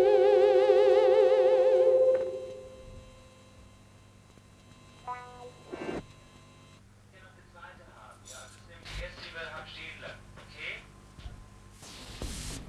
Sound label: Voices and Trumpet and All